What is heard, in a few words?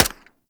Arch Long Rifle Mech; Warframe; Weapons; Archwing